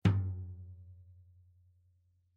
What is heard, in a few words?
drum